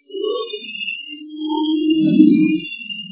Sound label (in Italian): lamento urlo; piano sotto; CastelloMonselice